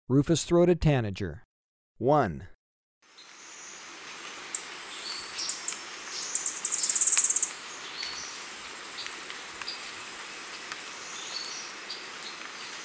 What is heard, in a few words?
Rufous-throated Tanager, Birds of Ecuador; S. America